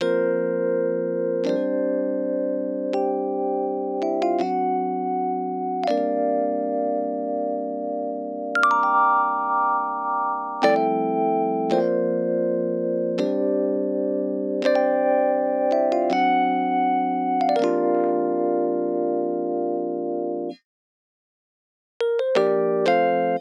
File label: rhodes B; Big Fish Audio; Legendary; Shes Mine